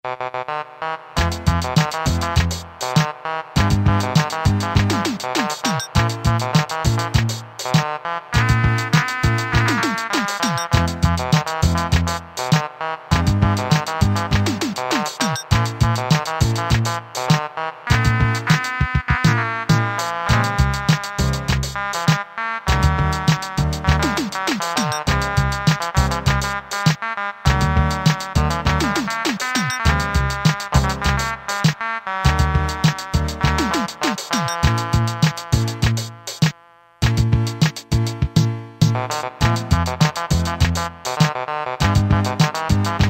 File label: disco, Casio MT-500 Rhythms